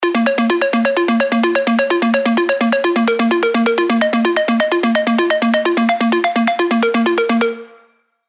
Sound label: PROG ARPS A#m